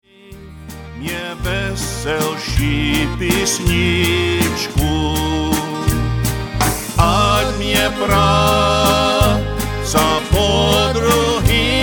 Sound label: singing